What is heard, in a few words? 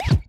clipboard push; NVDA